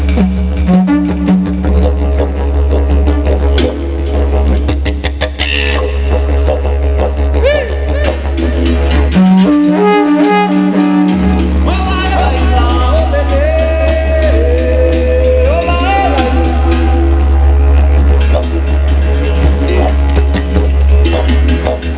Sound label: DIDJ